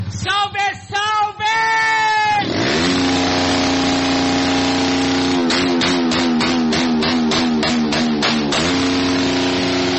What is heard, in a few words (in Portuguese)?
corta giro moto